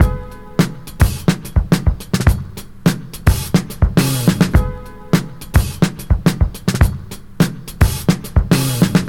106 bpm drum loop sample e key, kick tuned to the E note